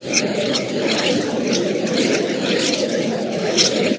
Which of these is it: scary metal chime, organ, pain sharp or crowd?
crowd